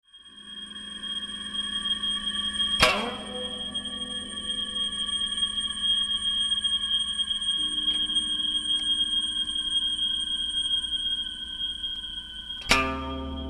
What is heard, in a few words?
Bamboo